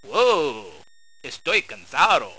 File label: unhappy sad (opponents did well); Spades Deluxe